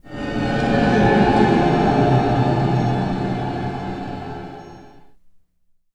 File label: IMPACT SCARY, Cinematic Impact